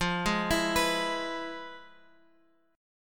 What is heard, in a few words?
F Minor Major 7th Flat 5th Guitar Open F; FmM7b5; arpeggiated; 17,15,16,18,x,x